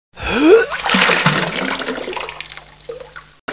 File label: puke